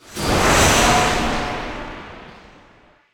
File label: Magic Transformation; David Dumais Audio; Magic Sound FX Pack 1